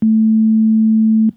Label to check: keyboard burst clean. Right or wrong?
Wrong